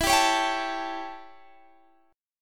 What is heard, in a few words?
A 7th E, chord